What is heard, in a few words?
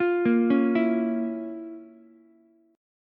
F Major 7th Suspended 4th Sharp 5th, Ukulele; arpeggiated; JGuitar